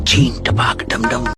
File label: Ringtone